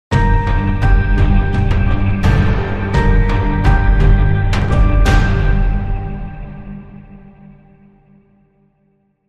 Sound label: Lurking Danger Sting